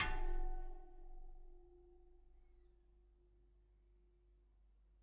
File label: Bicycle Spoke